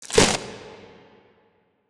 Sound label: kaiju adult chomp; biogenesis